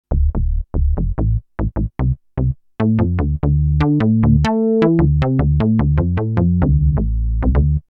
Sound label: Jupiter Bass